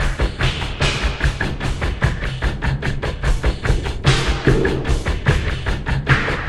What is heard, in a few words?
Lake beat; Torchlight III; PS4